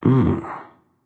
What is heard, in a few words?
thinking; smite; susano